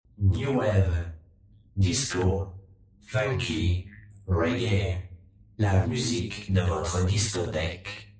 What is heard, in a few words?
Jingle